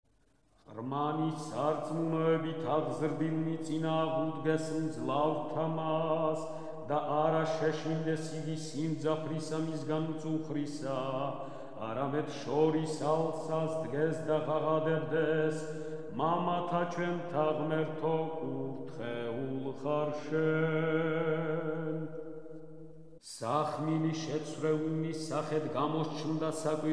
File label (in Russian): irmosy iz kanona; Rozhdestvu Hristovu